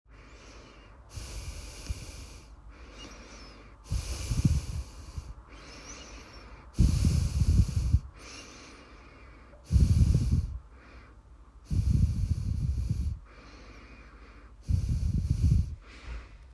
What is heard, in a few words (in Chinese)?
呼吸